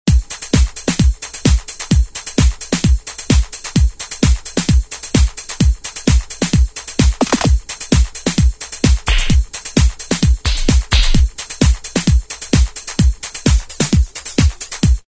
909 Pump 130; Roland M-DC1

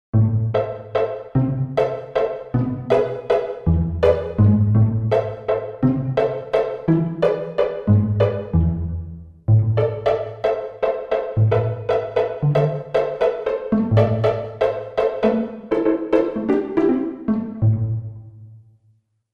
Pizzicato, Roland JV-880; Factory Patches

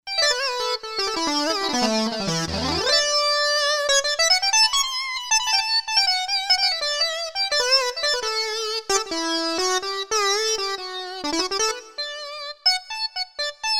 Sync Lead; Roland SC-880; Factory Patches

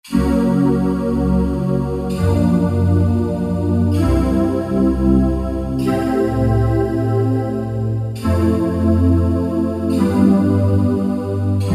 O K Chorale, Roland D-50; Factory preset demos

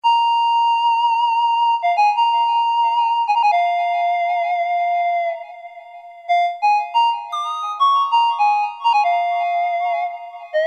FLUTEY; Akai SG01v

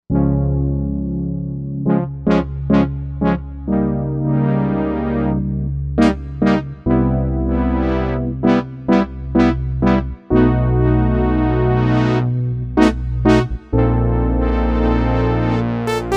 Analog, Korg M1; Factory Patches

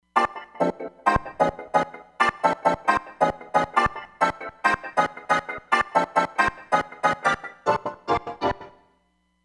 Orch

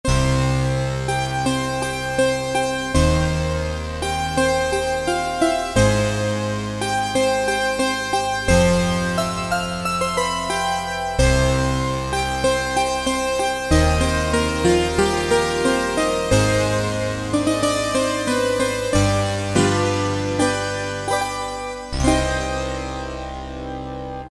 Zean---, Roland PN-D50; Roland PN-D50-04

